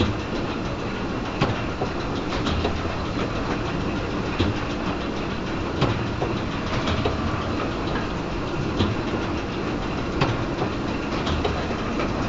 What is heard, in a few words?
ambience industrial